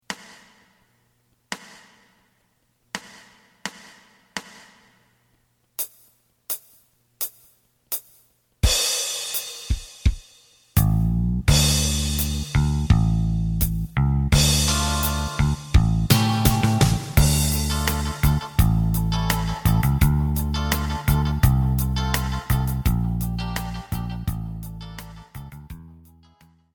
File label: lead